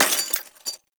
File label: Glass Bullet Impact; Warframe; Ambience; NineteenNinetyNine